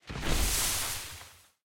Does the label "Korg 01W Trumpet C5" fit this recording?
No